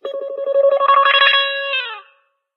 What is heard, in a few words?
Wah Wah Beep, ringtones; LG P500 Optimus One